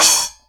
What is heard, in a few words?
Real Closed Hats